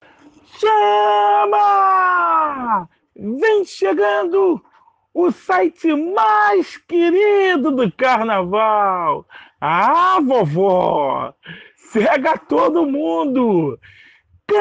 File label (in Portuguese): grito